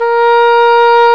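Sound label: English horn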